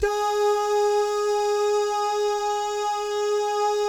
DAH, Vocal Jazz; ILIO

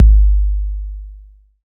BD808, TR-808; 90 s Sample CDs; Sound & Vision - Gigapack I CD 1 (Roland); TR-808 & 909

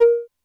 Mid Pipe, Drum Machines Alesis D4

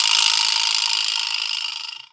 Vibraslap; musicradar; cartoon caper

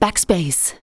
vox, Techno Trance; Ueberschall

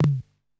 KPR FLAM, KPR-77; Hollywood Edge; The Big Bad Beatmachine

